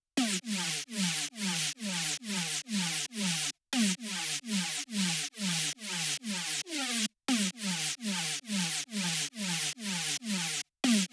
heavy liquid bass texture

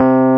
RHODES C2; AKAI S-Series CD-ROM Sound Library VOL-2

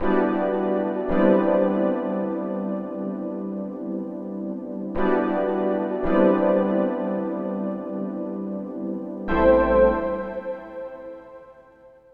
Oodle Pad; Zero-G Smoke

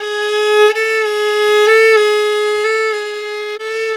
Violin; Sonic Foundry (Sony Creative Software); World Pop; Stringed Instruments

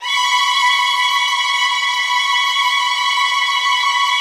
WH TR B5, Violin; Q Up Arts; Denny Jaeger Master Violin Library; PERFORMANCE

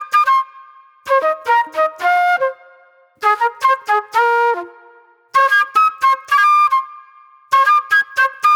Raw Funk Flute

